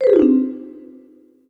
Incorrect; MexicanaChillitime; ClubFusion

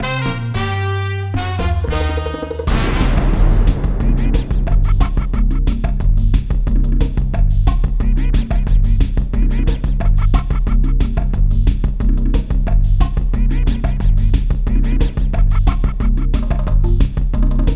Loot Shoot Music; CashBomb

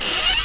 passport door close; GreatEscape3

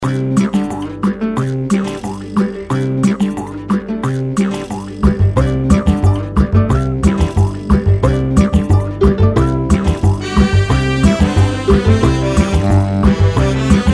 Chill Run Music; MexicanaChillitime

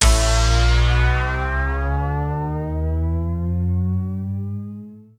Bonus Wheel Symbol Anticipation; ClubFusion; Showtime Classic

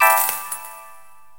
Vibe Win Transfer Stop; ShowtimePOG

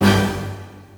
Bonus Wheel Symbol; ClubFusion; Showtime Classic

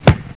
Button; ItsComingHome

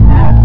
Arrow Fall; GreatEscape3

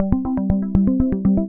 Music Box Reel Spin; JackpotDarts; ClubFusion

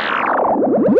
Spin Down; ItsComingHome

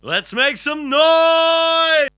Burp; LuckyPunch; ClubFusion

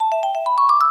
Vibe Win Transfer; ShowtimePOG; ClubFusion

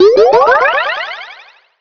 prize Card; World Masters Pro; Dart Sounds

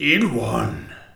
hohohoho; PubQuizKnockout

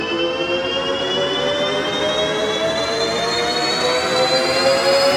Bonus Wheel Symbol Anticipation; JackpotDarts; ClubFusion

